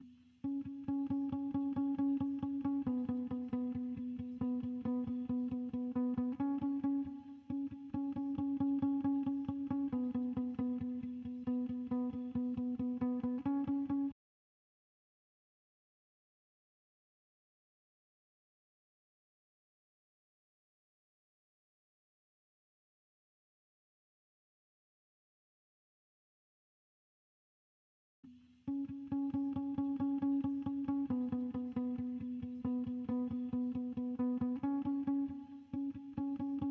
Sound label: 136 BPM E Maj Guitar Layer; Loop Stems; Cymatics; Utopia; Lovers